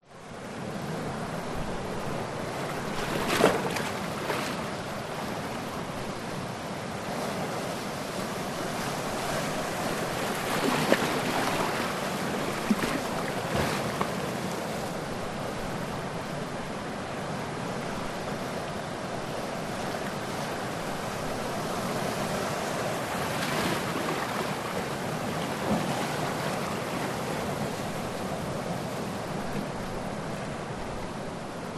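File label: nature ocean waves medium splash into rocks distant surf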